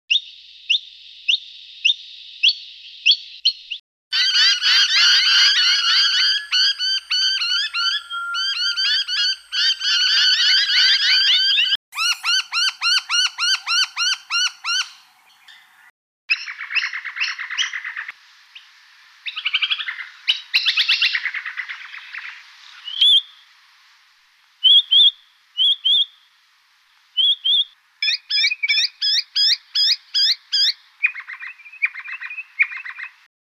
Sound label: noisy miner; honeyeaters; canberra garden birds